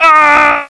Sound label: falls; quake2